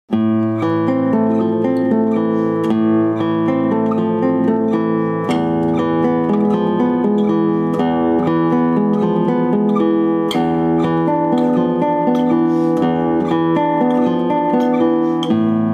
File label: larghetto, guitar